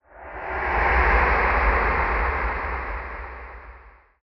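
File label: scifi ambiance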